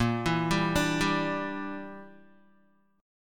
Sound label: A sharp 6th Flat 5th, Guitar; A#6b5 arpeggiated; JGuitar